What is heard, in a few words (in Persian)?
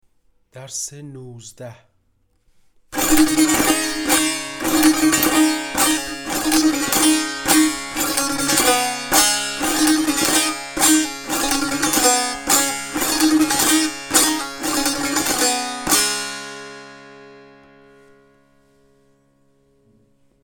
مشق تنبور; تنبور جلد دوم; هفتاد و دو مشق تنبور